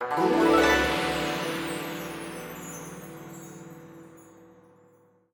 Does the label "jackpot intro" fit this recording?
Yes